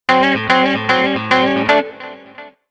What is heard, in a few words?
country